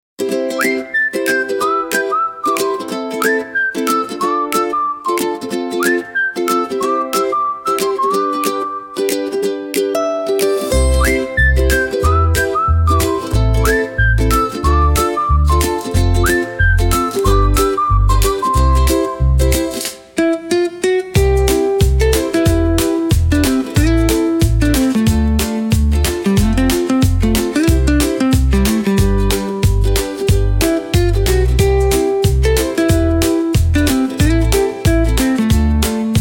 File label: upbeat ukulele